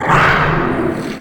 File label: Territorial Agression Shout; Pet Mods